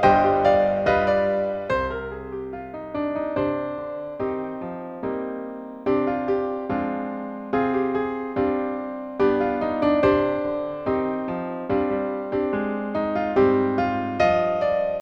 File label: accomp; battle of jericho